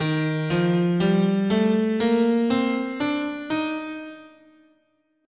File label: D sharp Lydian, guitar, scale